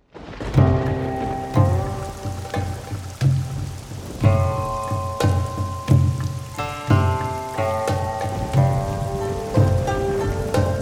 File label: weather alarm thunderstorm; Oppo Find X5